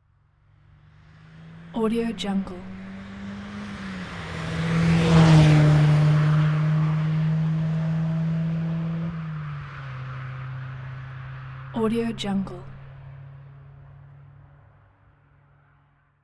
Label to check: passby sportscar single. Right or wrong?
Right